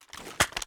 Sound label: raise up, weapons; gmod; viper